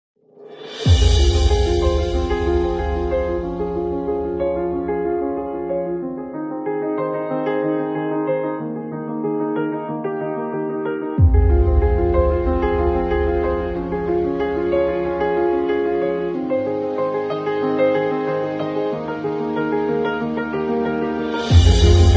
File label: Cinematic Dynamic Epic